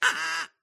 duck call vocalization uh-oh hurried or okay cheeky mid; skyclad sound